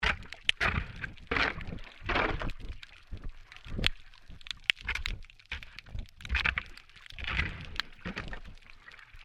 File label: MAINTAINENCE WORK UNDERWATER SHOVEL SCRAPING